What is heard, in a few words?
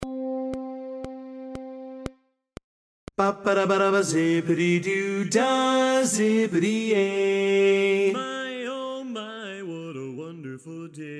Tenor